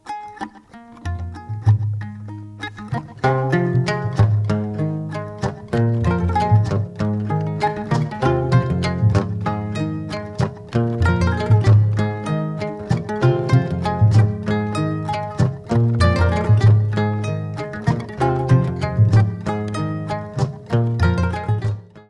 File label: river tune